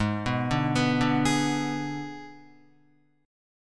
Ab Diminished Ab Guitar 4F,4A,5C,5G,5C,6E; arpeggiated; 3,2,2,4,2,4

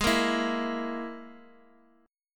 G sharp Suspended 2nd Sharp 5th, Guitar, DADGAD; x,x,x,1,1,2

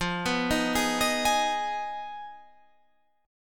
F Minor 7th Flat 5th F down Guitar Standard; Fm7b5; arpeggiated; capo=2

